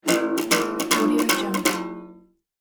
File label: cartoon broken guitar tuning down